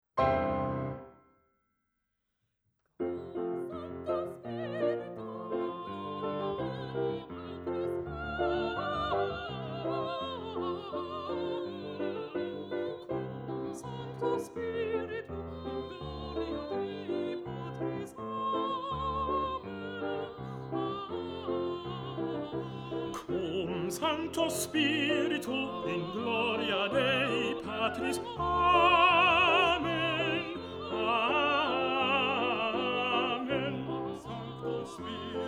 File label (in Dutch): Tenor, Cum Sanctu Spiritu; Rossini; oefen CD; Versterkt